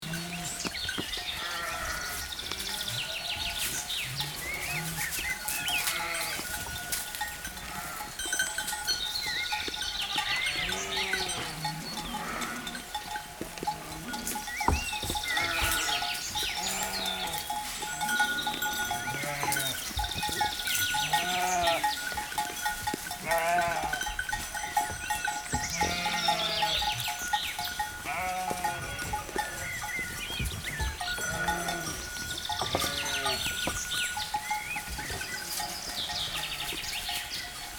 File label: Sheep and goats bleating farm ambience loop